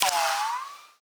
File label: bullet ricochet; Rust